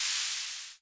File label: cym, Hammond Auto Vari 64; DRUM MACHINES; 1974